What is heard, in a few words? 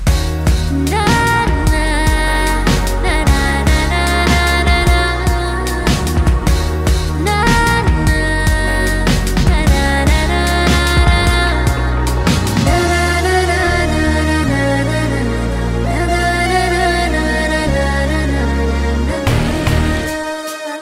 BG Humming